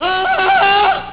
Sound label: ahhh